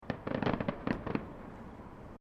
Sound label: Fireworks Small Display Crackles; Backgrounds; Crafted Signals